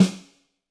Snare, Roland TD-20

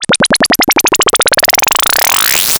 Synth FX

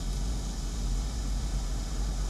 Ambience Loop, outdoor

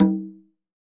CONGA LW; EdgeSounds; Drum Mashines; R-8 DRUMS

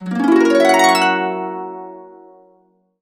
Complete Harps; USB Soundscan vol.62; AKAI

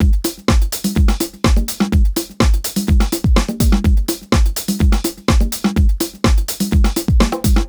beat, 125 Beats; PowerFX

